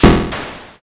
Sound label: thunder magic